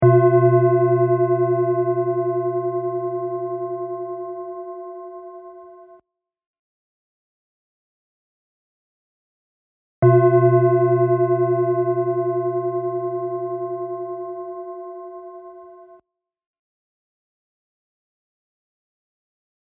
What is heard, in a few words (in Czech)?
Koherentni dychani zvuk